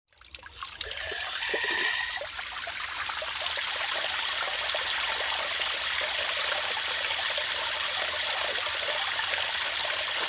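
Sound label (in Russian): струи воды появляются и исчезают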